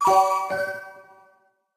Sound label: message alert; Sony Xperia Z3